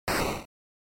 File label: Noise Phrase, NES; NES-General